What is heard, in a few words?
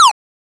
shoot, attack sound; カタナ１